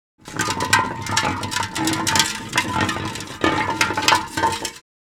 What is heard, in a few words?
Empty paint can rolling on the ground